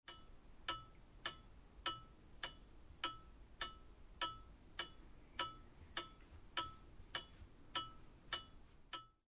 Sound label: Foley